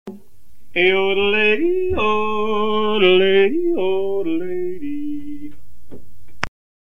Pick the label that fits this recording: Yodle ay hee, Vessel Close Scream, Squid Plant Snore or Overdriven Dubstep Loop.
Yodle ay hee